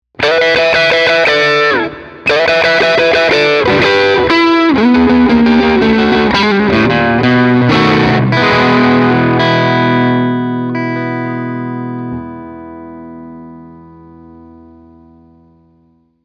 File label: Chicago Blues